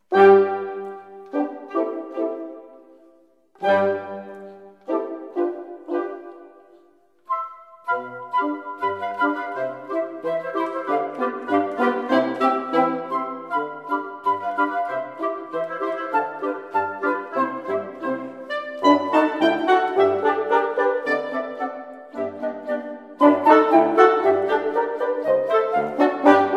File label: Pizzicato Polka